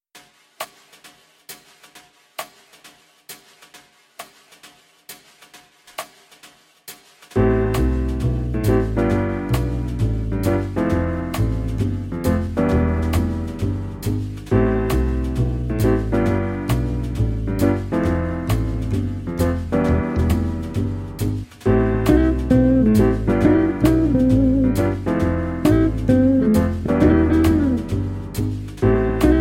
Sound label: swing jazz Swing 432 hz